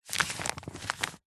household pamphlet large paper handling lightly crumple; zapsplat